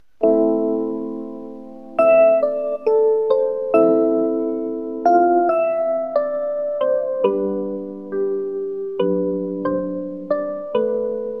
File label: rainy lofi